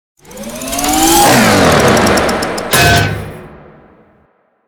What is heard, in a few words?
throttle hit